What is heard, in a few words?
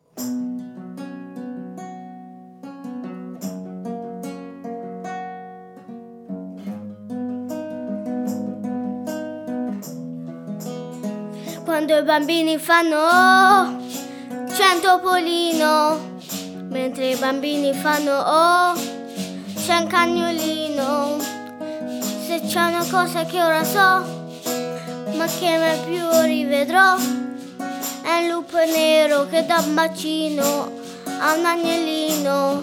children singing; community song project